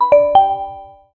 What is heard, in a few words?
simple notification; oppo